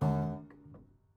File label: Prepared Piano